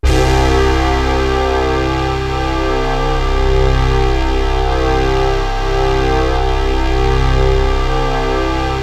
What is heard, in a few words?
POPBRASS; MORPHEUS